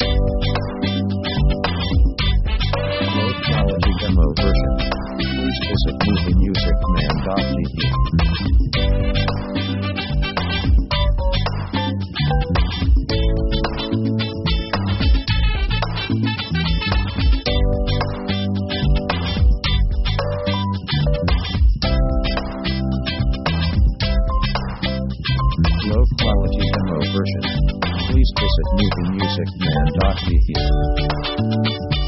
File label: pop easy 110